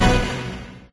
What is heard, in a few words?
right gate; There; ThereClient; gamekit; racekit